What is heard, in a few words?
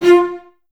Cellos F 4; Miroslav Vitous; String Ensembles; LINKS ORCH